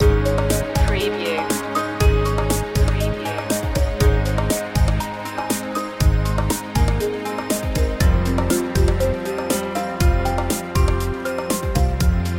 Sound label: string beats loop